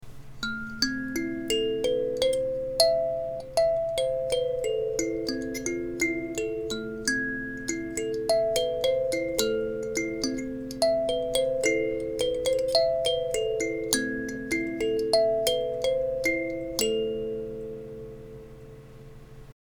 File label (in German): klangbeispiel maxi kalimba